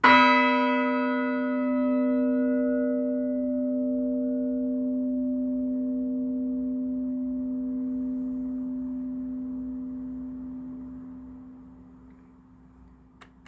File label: bells of sussex; milland